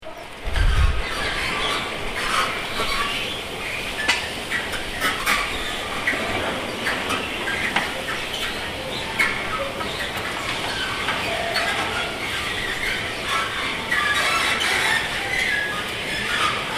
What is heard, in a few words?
squeaking noise